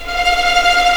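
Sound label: F5 tremolo p violin right, violins tremolo; East West; The Ultimate String Collection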